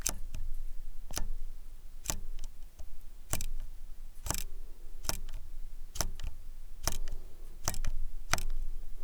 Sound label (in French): CHANGEMENT VITESSE